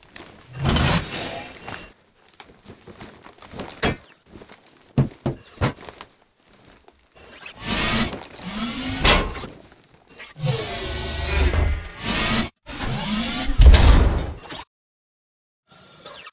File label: titan raise lower; Titanfall 2